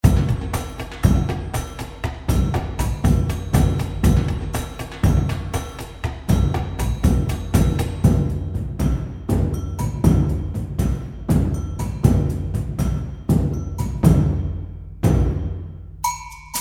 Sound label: World Inspire Set